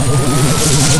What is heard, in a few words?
phasemissile; Tanks